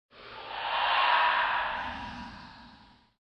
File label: Slow ghost passing